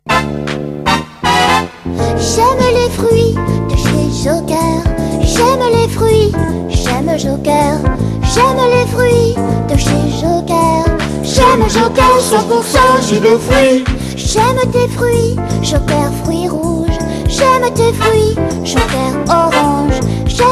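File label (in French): voix spéciale chant enfant; joker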